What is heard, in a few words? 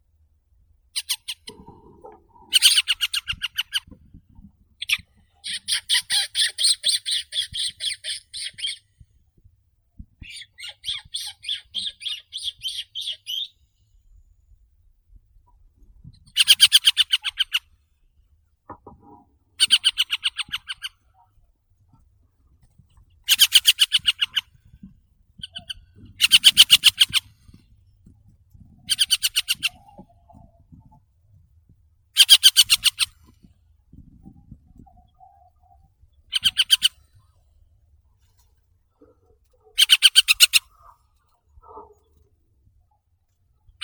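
hen harrier, Circus cyaneus; lun polevoi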